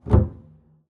pizz noise strum, double bass; dsmolken

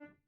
Grand Piano, Kawai, rel D3; Chordophones; Releases

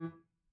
Grand Piano, Kawai, rel E2; Chordophones; Releases

